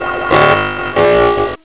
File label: attack